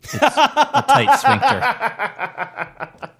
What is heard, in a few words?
huge laugh